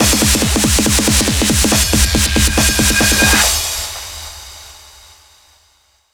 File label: 140BPM 2Bars, HandsUp & Trance Fills; Catalyst; Vengeance Samples